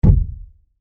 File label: Impact Wood Large, Heavy; Wood (Sound Effects:Materials); BlastWave